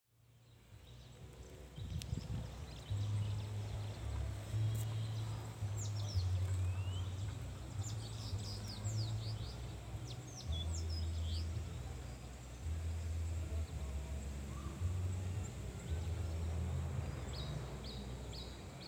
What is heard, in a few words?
sound of nature deep in the forests